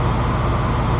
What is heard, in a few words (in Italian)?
Veicolo, motori